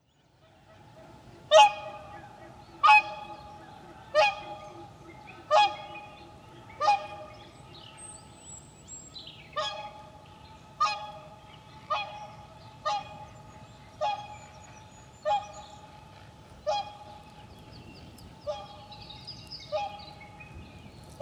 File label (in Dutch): Wilde Zwaan Cygnus cygnus